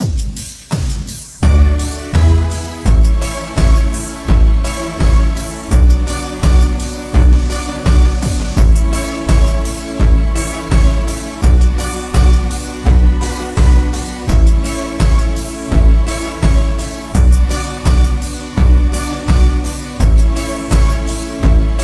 major key chord singles; harmony

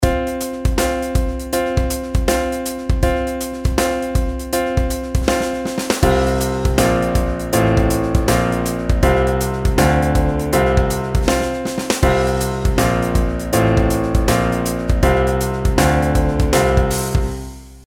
pitch patterns stepwise

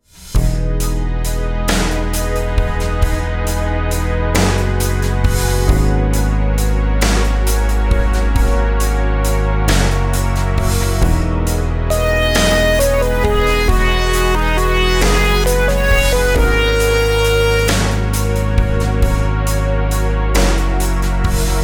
rhythms compound 8s w16s

